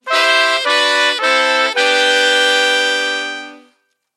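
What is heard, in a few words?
sounds with a completion, ending effect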